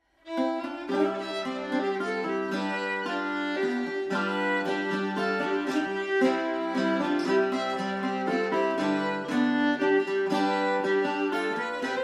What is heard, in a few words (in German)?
Buendner Mazurka